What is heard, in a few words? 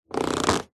office chair leather sit down creak